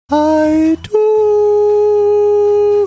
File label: singing